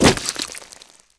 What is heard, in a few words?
gib; Shadowman